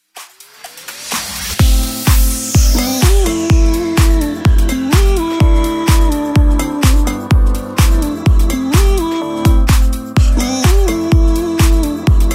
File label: pop dance redrum